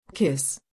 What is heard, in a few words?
kiss